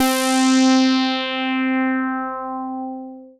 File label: Dance Floor Bass, Multisample Basses